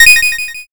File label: notify